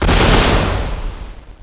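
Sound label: weapon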